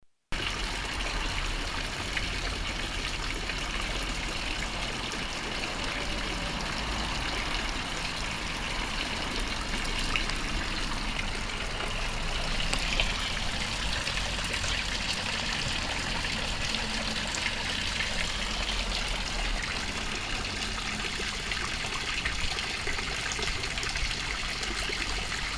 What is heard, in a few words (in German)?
Brunnen Kirche Ebelsberg